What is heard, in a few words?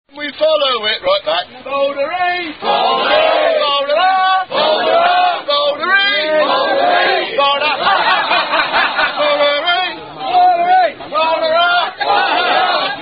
Unknown Hash Song; Folderee